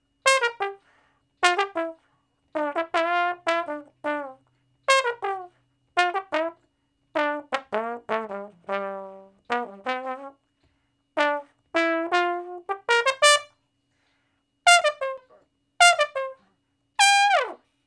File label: Trombone Shuffle (G); Crimson Blue and Fabulous Horncraft 4 RnB; Horncraft for R&B; Solos